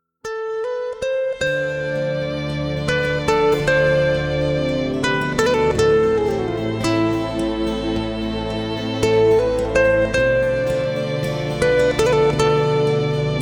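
Relaxing Guitar, instrumentale gitarren und panflöte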